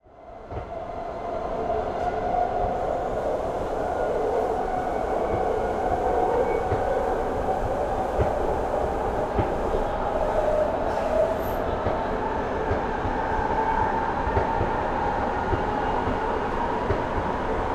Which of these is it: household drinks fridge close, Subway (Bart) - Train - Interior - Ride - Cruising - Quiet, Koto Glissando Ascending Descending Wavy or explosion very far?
Subway (Bart) - Train - Interior - Ride - Cruising - Quiet